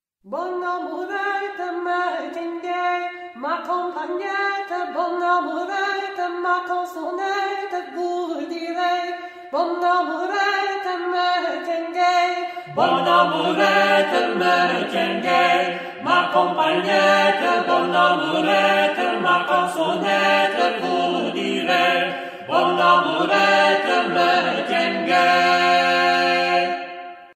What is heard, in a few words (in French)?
rondeau polyphonique; adam de la halle; trouveres